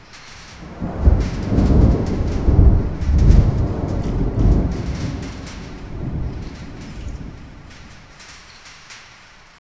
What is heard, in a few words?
the mournful howl of wind, fence